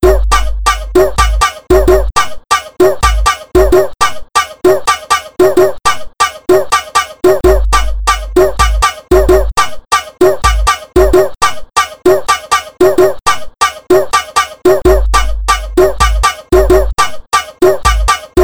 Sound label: beat